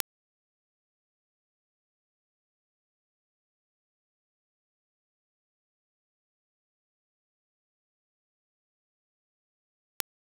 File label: clicktrack 6bpm single sample impulses